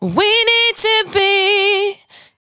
92bpm Bb, Velvet Vocal; Zero-G

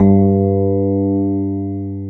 JV SENSITIVE, JV-1080, PIANO

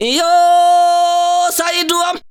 VOCAL LINES; Best Service; World Colours

